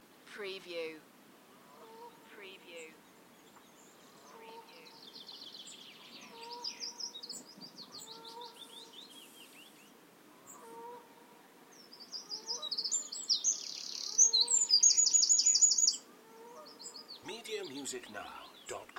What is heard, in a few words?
Nature Ambience Cym Bychan Wales Loop; beanstalk audio